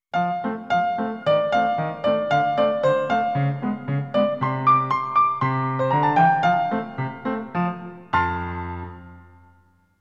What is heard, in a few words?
Course Clear Fanfare; NINTENDO